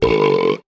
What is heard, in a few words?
burp short